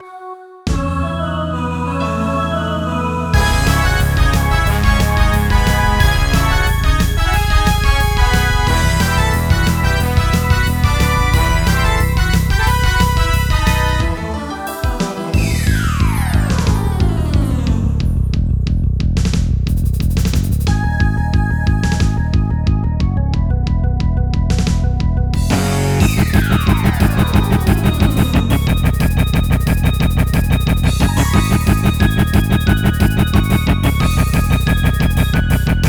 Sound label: Backing Track